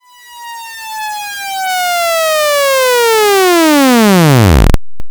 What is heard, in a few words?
Falling ship